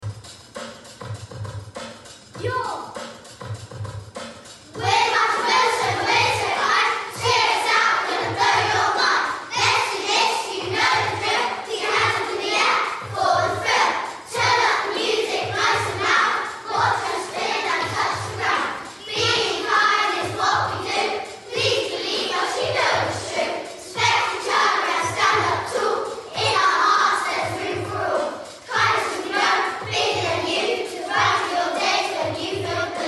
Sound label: rap